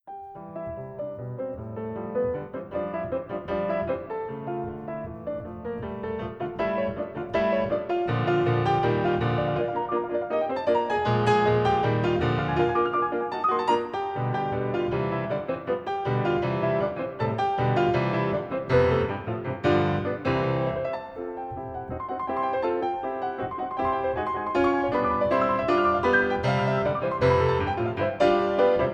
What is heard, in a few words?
Piano; Franz Schubert; Three Piano Pieces D.946; No.3 Allegro